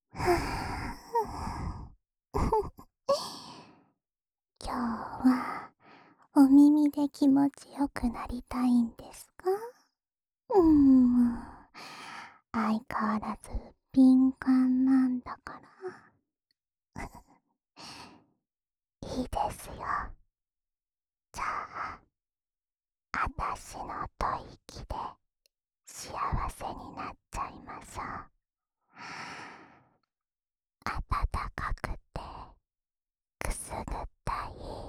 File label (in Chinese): 安眠用 吹耳朵循环（右耳）